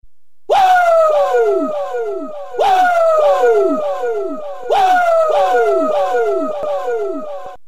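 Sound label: New Devil Message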